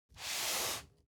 object box cardboard medium slide short soft

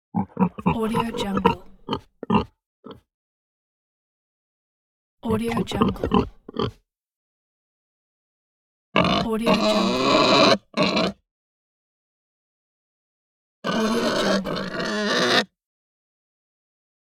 pig

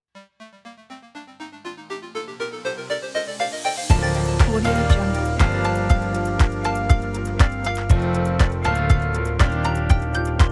hightech energy uplifting

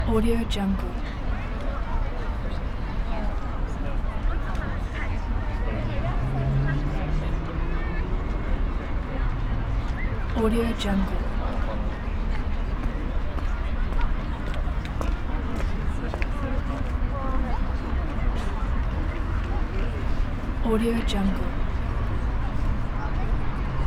city pedestrian street ambience

